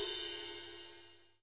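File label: Ride, Percussion Hits; Zero-G Jungle Warfare 1, 2 & 3; 90 s Sample CDs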